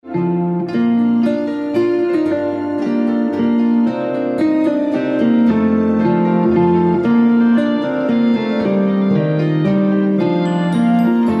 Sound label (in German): Leier Und Klavier